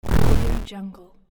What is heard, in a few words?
glitch noise